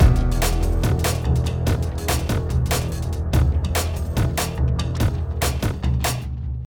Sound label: Music transition music segment End Fight; Sifu